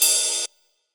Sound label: Lo-Fi Ambient Open Hat; DRUNK DOG